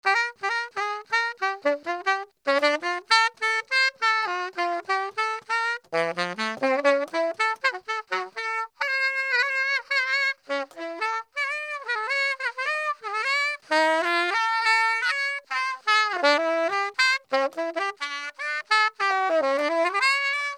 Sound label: sax